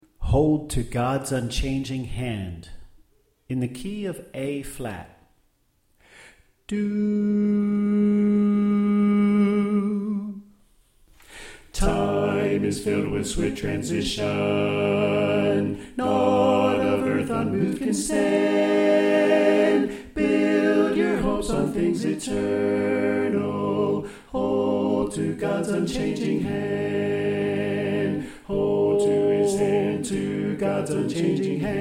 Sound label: Tenor Left